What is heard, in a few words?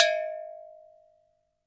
WATERBEL, WATERBELL; Univers Sons - Raricussions